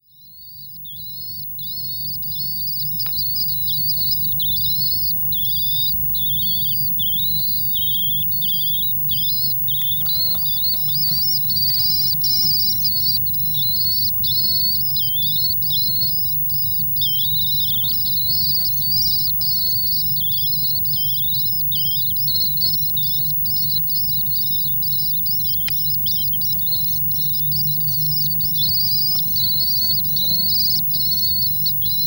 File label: swift chicks